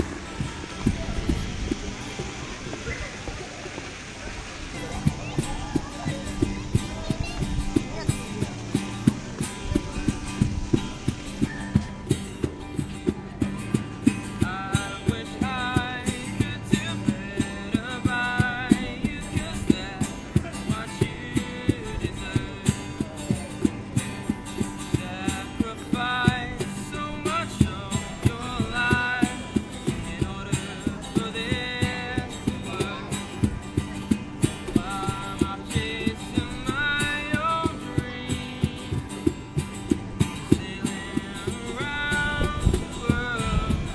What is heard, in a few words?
blue harp; at bedford buskfest